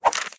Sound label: Fan; Cut the Rope: Time Travel